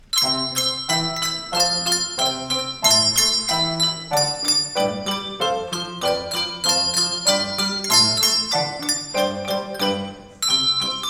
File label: Bells; 2024 fall holiday concert recordings